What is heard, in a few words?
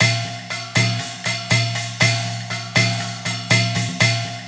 MIX, PERCS 120BPM; INIS; Opium